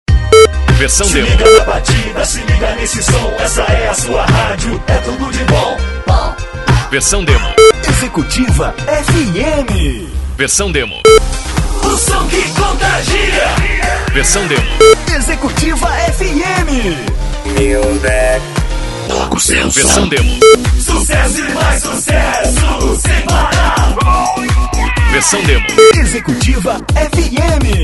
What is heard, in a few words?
vibe